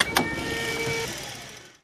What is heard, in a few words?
Electric Wheelchair Start, Run and Stop, With Switches; Shorter